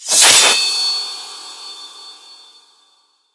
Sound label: Blade Dash; Deft